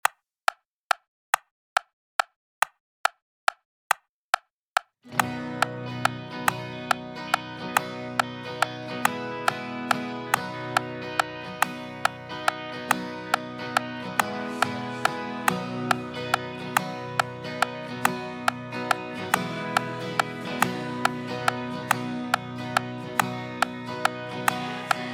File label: tracks and click